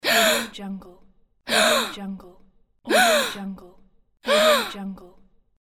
female gasp

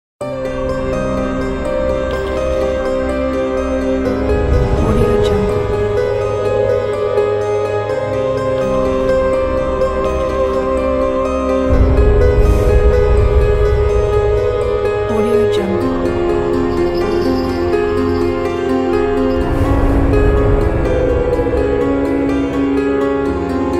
horror halloween

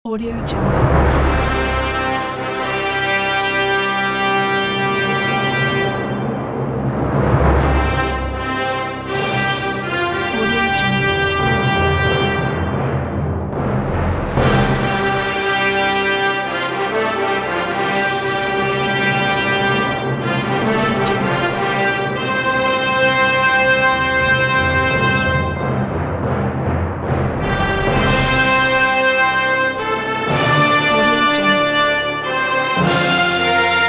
trumpet fanfare

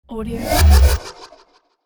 phase transition scifi

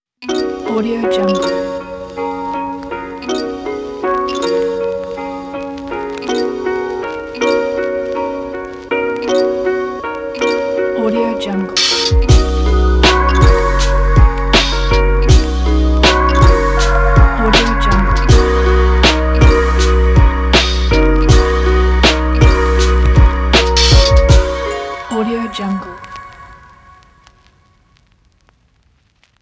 lofi lounge logo

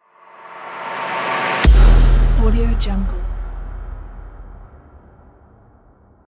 whoosh boom

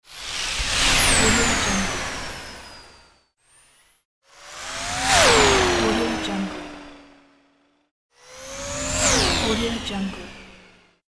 space ship

